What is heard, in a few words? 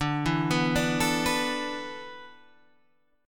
D 6th Suspended 2nd D, chord